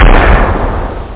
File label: D-20 SNARE; Amiga 8-bit Sampled Voice; 8,363 sample rate